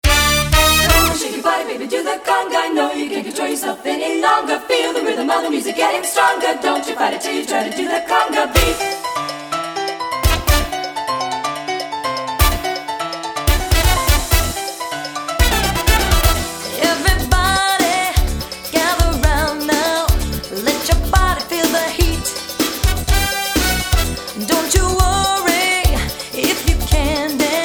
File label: Conga